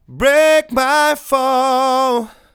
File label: Break My Fall, Vocal Phrases; Ultimate Vocals